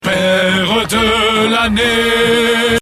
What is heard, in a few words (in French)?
chanson